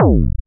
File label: Kick One Shot, Drums